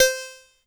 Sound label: Yamaha PS-55 Harpsichord C5; Synthesizer - Keyboards